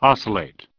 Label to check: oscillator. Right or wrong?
Right